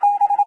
the golden era exclamation, windows 9x sound schemes